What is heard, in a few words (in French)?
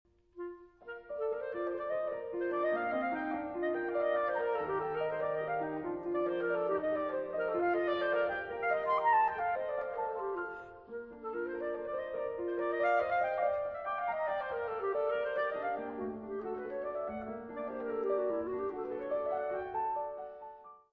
Clarinette